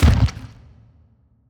organs; Thalamus; CARRIER

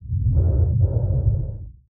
Drown Male

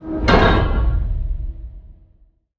Alien Door Close

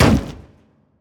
harpoon Through; Thalamus; CARRIER

